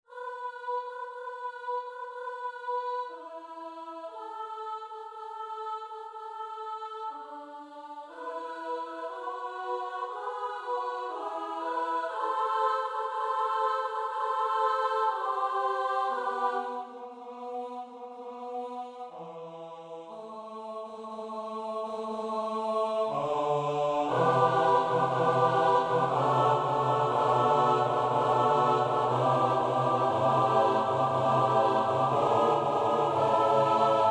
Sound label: kyrie, midi